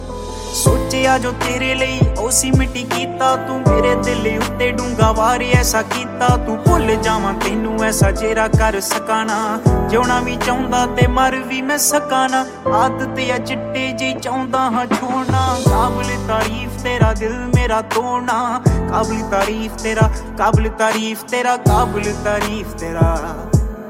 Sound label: Sad Punjabi New Ringtone